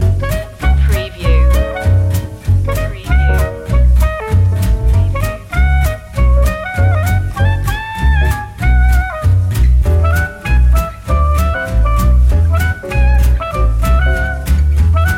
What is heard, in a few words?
feel good swing loop